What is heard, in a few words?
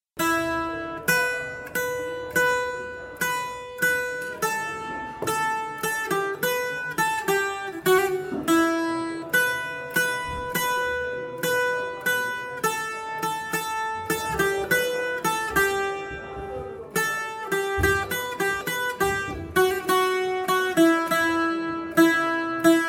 Tambura Lesnoto